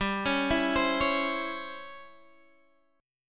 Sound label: C sharp Minor Major 7th Flat 5th G, chord